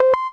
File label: device connect